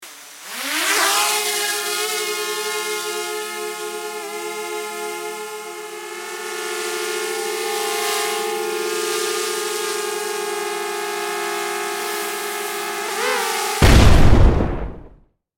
Military drone flight and explosion; explosions

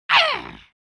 Goblin pain hit normal

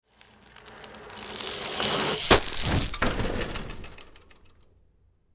Bicycle fall falling down losing control

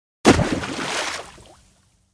Swimming pool dive in with a splash

